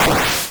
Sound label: MOVE; C64 Dreams; Multi-Sound Default